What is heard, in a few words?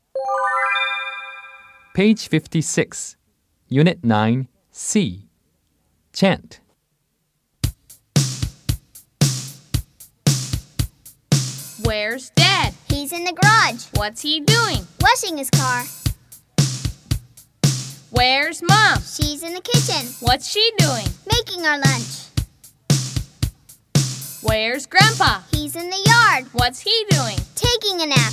Chant